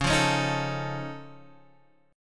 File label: F Augmented 7th Db, chord